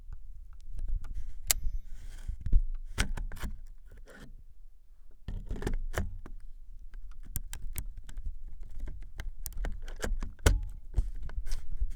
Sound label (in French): INSERTION EXTRACTION OBJECTIF MAMIYA RZ67